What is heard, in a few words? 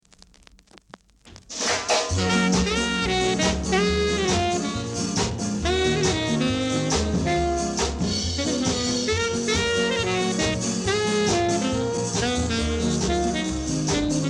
beat